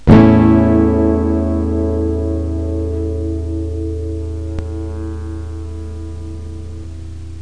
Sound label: noise; Mr. Wonder's Greatest Toyshop on Earth